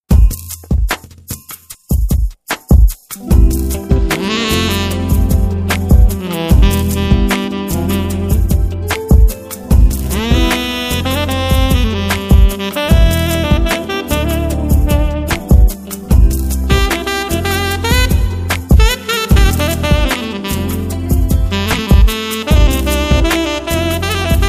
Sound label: smooth jazz; songwriter demo